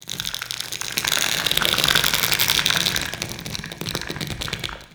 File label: Corpus Infested Tendon Stretch; Warframe; Ambience